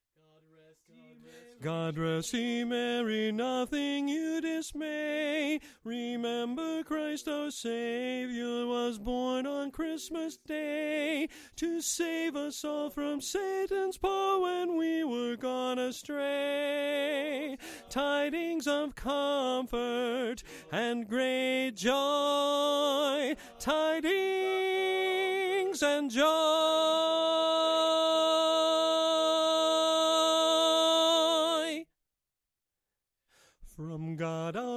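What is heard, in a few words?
Tenor, chorus; God Rest; christmas show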